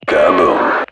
kaboom; ac-130 kill sounds

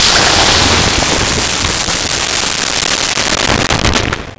object dissolve in goo; props

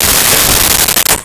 laser cut

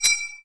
spoon fling

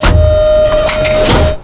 gear, bf109; lfs

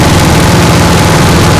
nose loop, bf109 weapons; lfs

